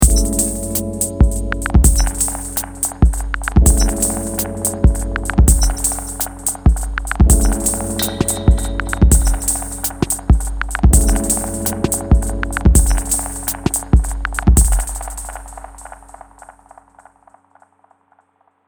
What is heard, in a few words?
Chord; Red Wing Work Icons